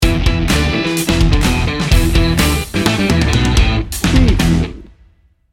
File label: bouncy bad boy sting bumper